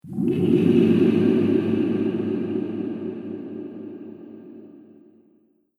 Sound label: Atmospheric Cave Whoosh